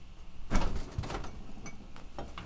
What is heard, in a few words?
fridge open